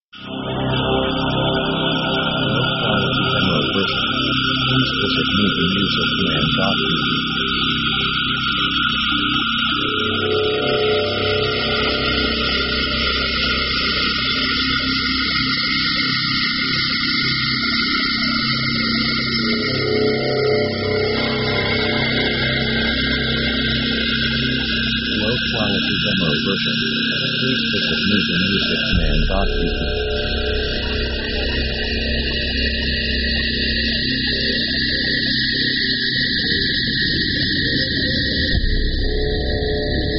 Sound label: moving robots; synth themes